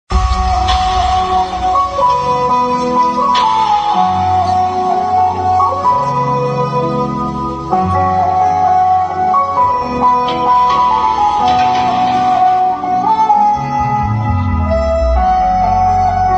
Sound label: emotional bgm mobile ringtone; pagal; vishwak sen; telugu